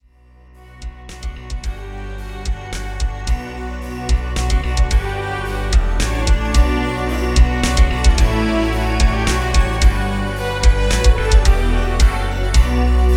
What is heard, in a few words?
synth test